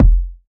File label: tight kick sample F sharp key; kick drum samples; one shots